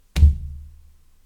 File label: Floor bang